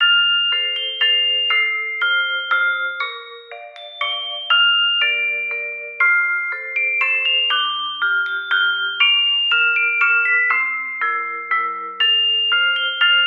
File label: Unstrung Music Box Intro